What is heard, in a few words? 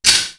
BIG TWACK; Univers Sons; Basicussions